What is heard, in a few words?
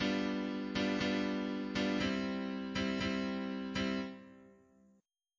chord; orpheus